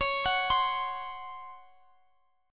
C sharp Major 7th Suspended 4th C sharp, Guitar Half Step Down